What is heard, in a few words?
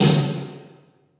snare reverb; schellingwoude